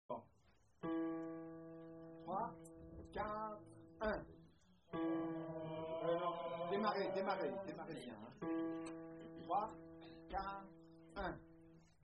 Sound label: tenor